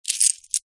foley money coins handful handling movement single hand; zapsplat